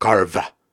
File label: Syllables and Imaginary Words, Viking Vocal, Hard